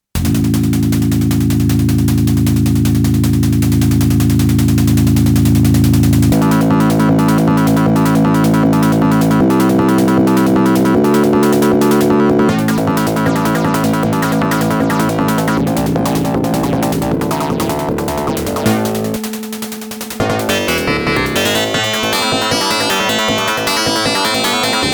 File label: miro pcm 10 opl3